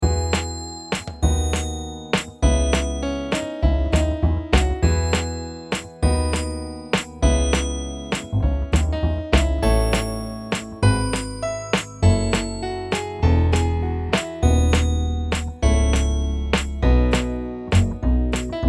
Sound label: Fast 8ths